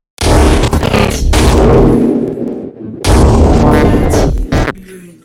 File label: Meca Noise sound design